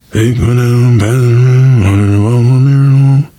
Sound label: nervous humming, survivor voice, biker